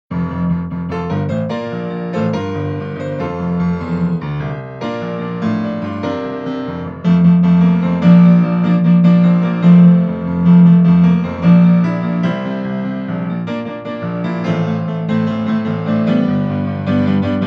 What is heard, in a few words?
Theme Instrumental